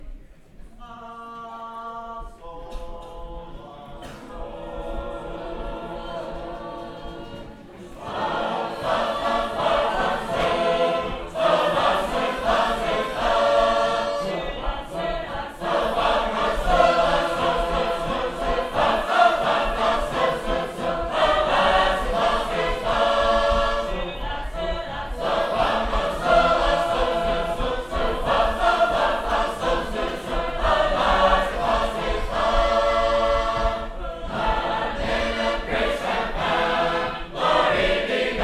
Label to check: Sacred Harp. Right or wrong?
Right